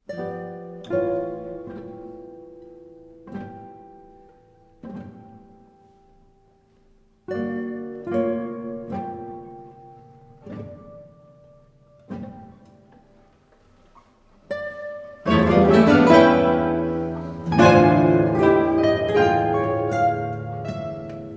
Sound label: concerto for four guitars and guitar orchestra moderato